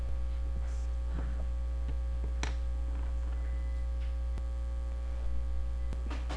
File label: sweep